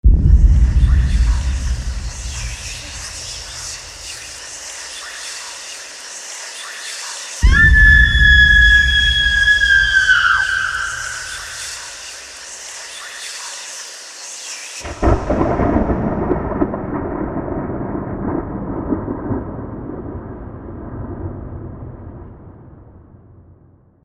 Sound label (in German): horror musik halloween whispers